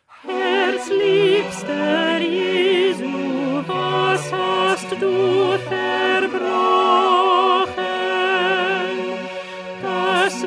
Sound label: Alto; Bach; St Matthew Passion